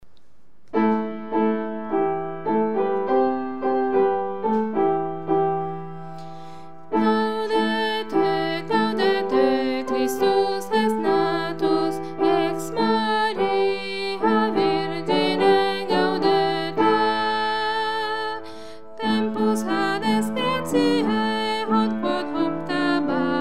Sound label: gaudete tenori